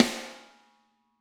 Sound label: Snare Crack; Drums; apulSoft apTrigga2; Steven Slate Drums